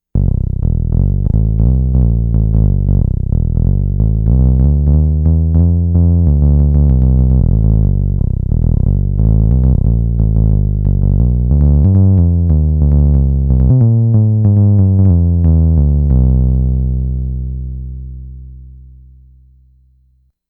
Bass Guitar, Roland SH-2000

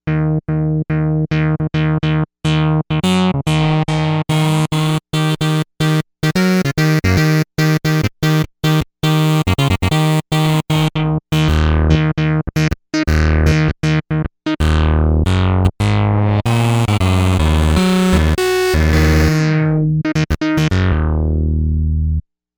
BuzzWood, Novation UltraNova; Factory Patches

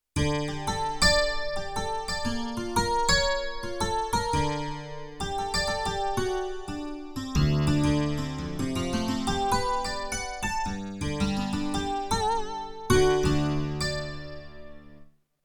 Chorus harpsichord; Yamaha TX16W

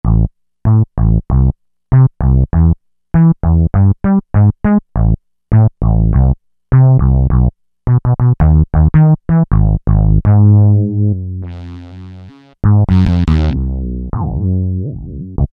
Blackness Bs, Roland V-Synth

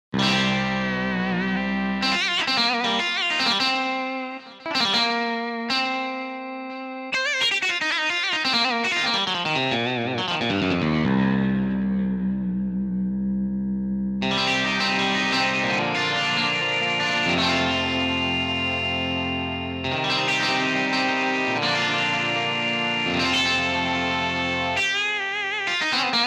Telemaster; Roland SRX-07 Ultimate Keys

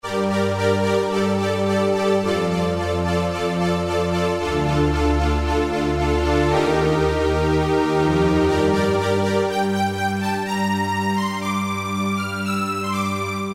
Synth, Yamaha TG33; Factory Presets

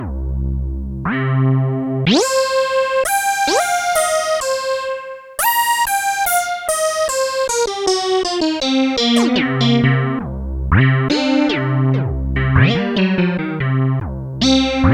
PORTAMENTO, Korg DW-8000; Factory Patches

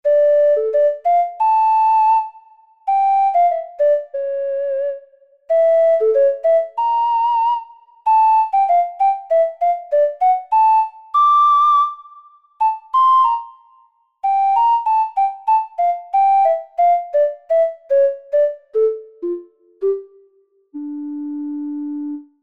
Ocarina

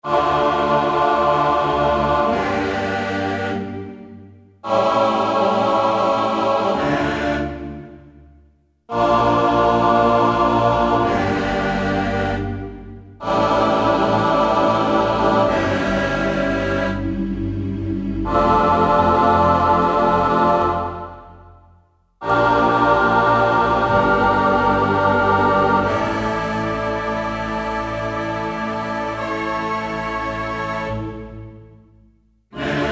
Amen, Alesis Z3; Sanctuary

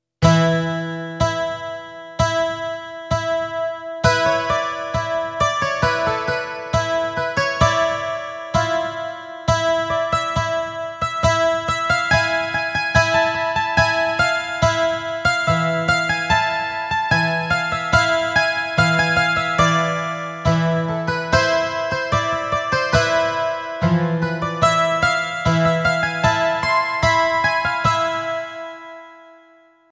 Santur, Yamaha PSR-550 XG sounds

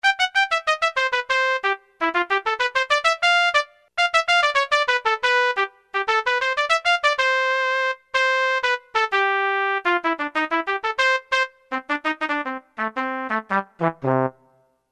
Mariachi Tp, Alesis Z7 Latin

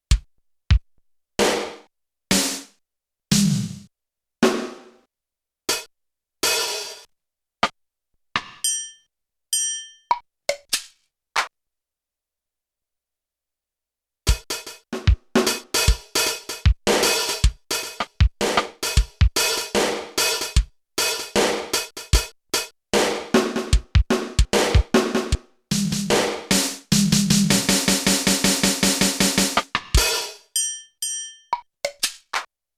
DRUM SET, Yamaha TX16W; Drum Kit; Drum Kit 2

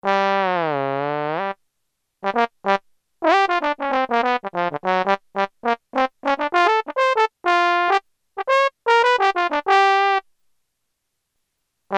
Plate; t.c. electronics M300

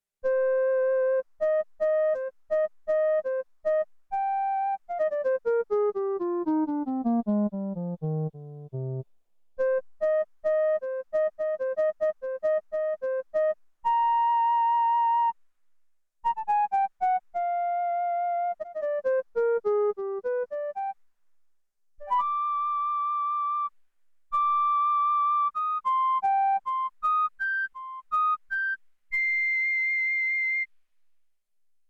PAN FLUTE; SIEL DK 80; Factory

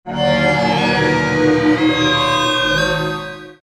Ring Me Lator, Roland V-Synth; Factory Patches

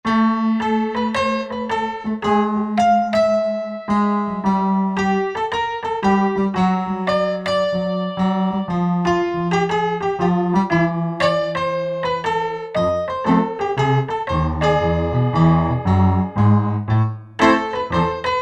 Dusty Piano, Roland SR-JV80-07 Super Sound Set

